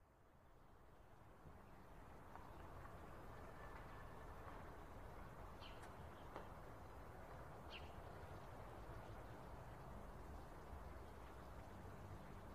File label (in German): stadt; minecraft